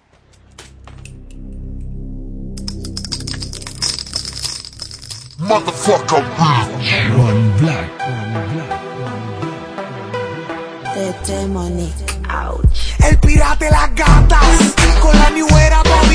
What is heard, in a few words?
reggaeton